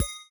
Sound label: store menu enter; Original TK Sounds